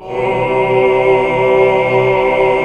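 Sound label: chord 2 3 R; Best Service Gigapack 1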